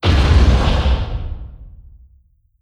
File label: distance xl arc emitter fire; weapons; Stellaris